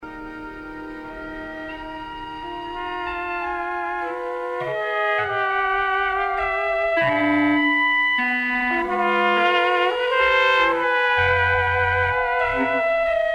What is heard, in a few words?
Calliope Instrumental